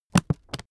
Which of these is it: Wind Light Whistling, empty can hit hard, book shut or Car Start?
book shut